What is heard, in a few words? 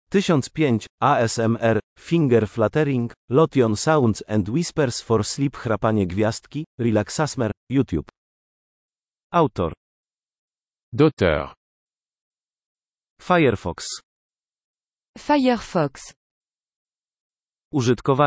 ASMR Finger Fluttering, Lotion Sounds And Whispers For Sleep